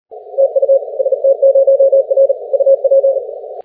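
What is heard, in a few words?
12m continuous wave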